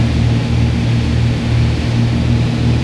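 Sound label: morava cockpit left, engines; War Thunder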